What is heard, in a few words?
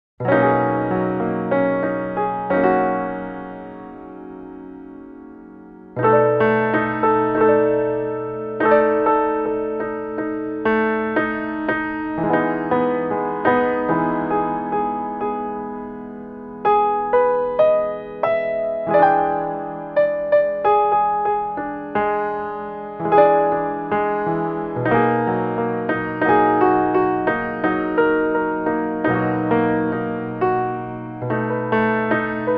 solo piano